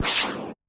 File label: Anime Air Movement